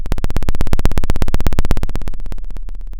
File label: Sound of a plane taking off